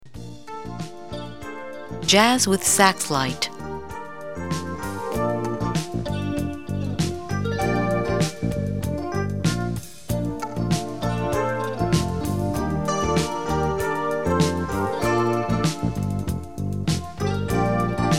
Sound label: jazz sax lite